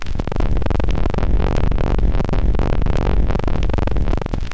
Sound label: loop, techno atmos